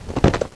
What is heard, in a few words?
Scuffle; Princess and the Pea; SoftKey